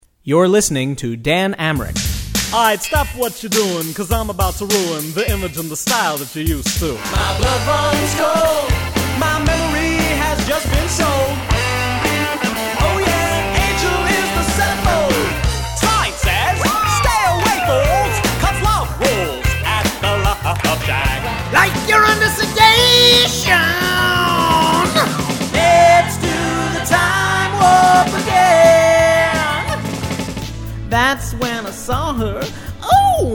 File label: Singing Sampler